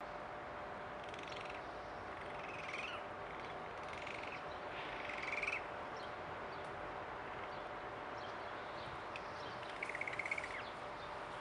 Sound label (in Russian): soroka golubaya